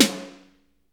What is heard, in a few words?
airy sizzle snare drum c key